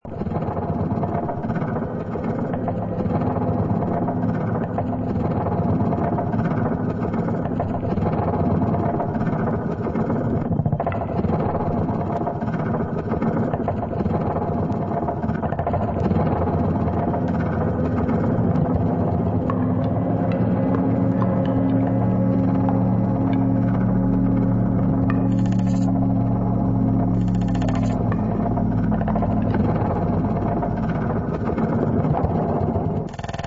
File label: Percussion